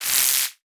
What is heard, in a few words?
Multimedia Internet CD-Rom Flash Electronic